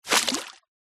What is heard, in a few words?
fishing net splash hit water fast